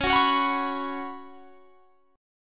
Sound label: C sharp 7th Suspended 4th C sharp; C#7sus4; strummed